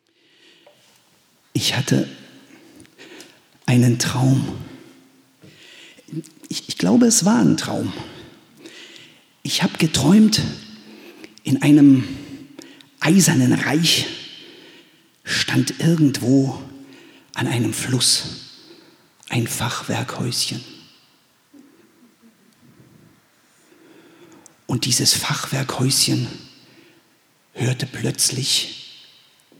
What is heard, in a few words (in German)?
Improvisation; Oderbruchmarchen